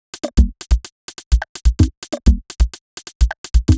Top C 127bpm, House Drumloops; Zero-G House Fabrik Drumloops; ZG Drumloops

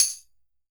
tamb, PERCUSSION; Roland MV8800

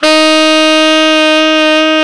TENOR SAX; Alpine Volksmusik

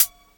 HH, Dance Mega Drums; Best Service; HH-HIP

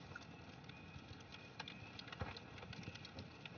Ambience Loop, outdoor

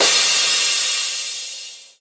Crash, Cymbals

